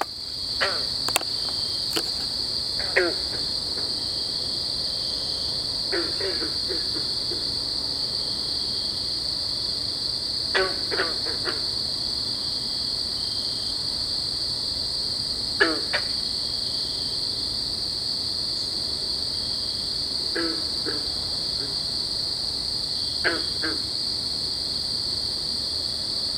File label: lithobates clamitans